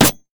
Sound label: jester basic attack; LoL; shaco